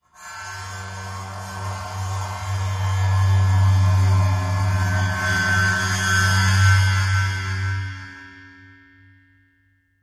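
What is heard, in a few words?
Splash Cymbol Bowed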